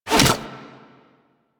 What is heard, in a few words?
Fishing Spear Line Retract Catch; Warframe; Infested Fishing Spear